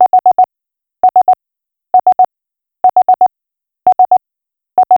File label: soft growing beepi